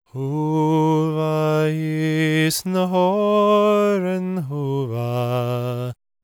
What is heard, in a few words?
GAELIC, World Voices; ILIO; Vocal Planet